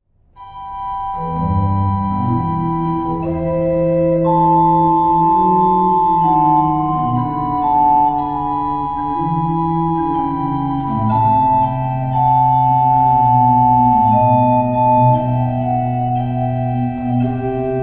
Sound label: violin organ